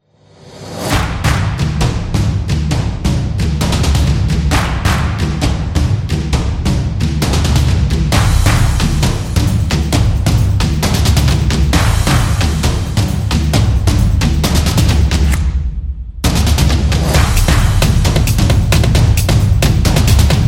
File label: A Drumming